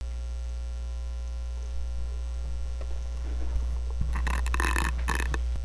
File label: frog